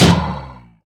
iron golem repair; Minecraft

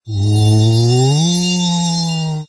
Emote Santa Ice Wizard

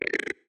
strider idle; Minecraft

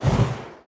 Lich attack effort

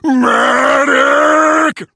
hurt vo; ruff

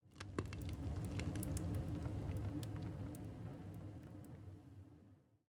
blast furnace fire crackle; Minecraft

